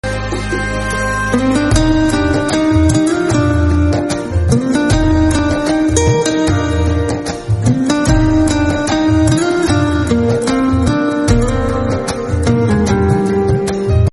piano instrumental

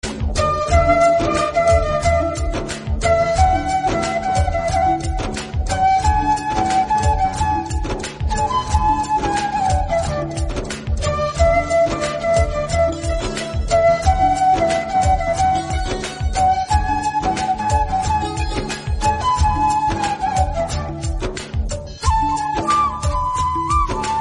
instrumental tamil

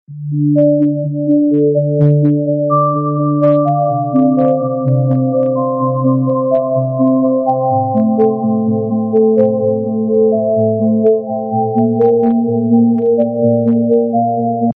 bell

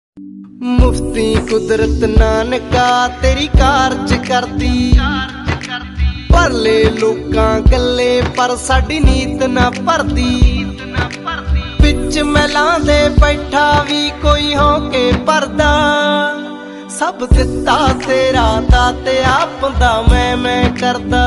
emotional punjabi

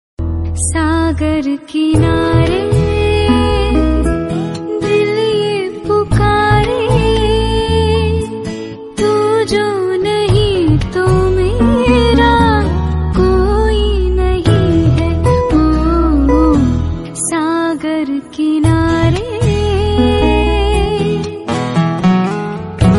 hindi sad song female version

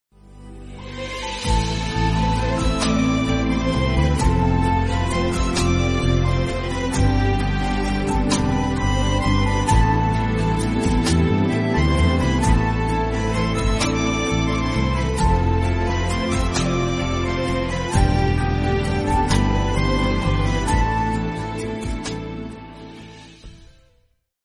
romantic bgm

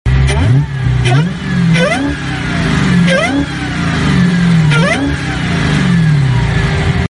supercharger whine

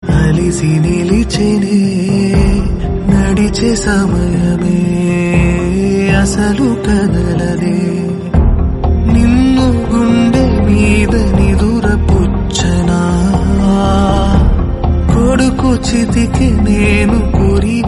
telugu; vikram

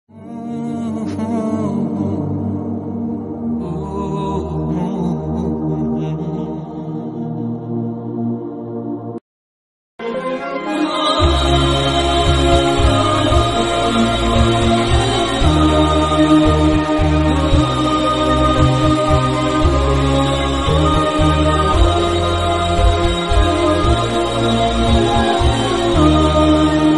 arabic nasheed, islamic

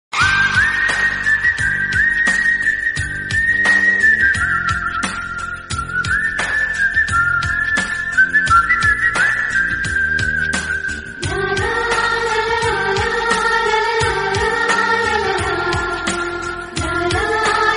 background music

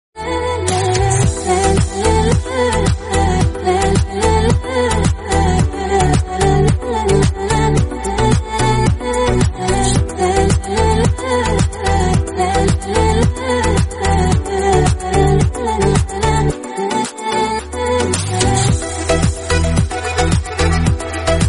bgm remix

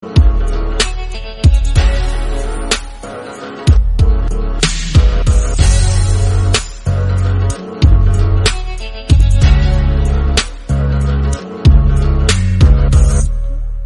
instrumental

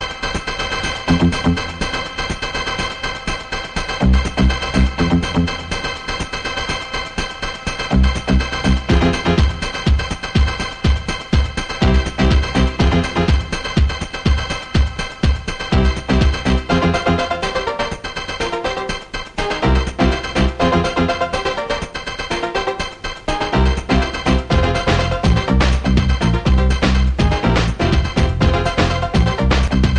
bgm background theme

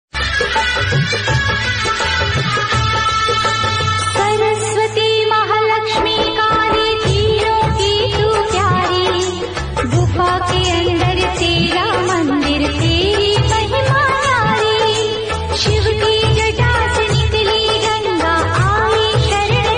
devotional, Hindi

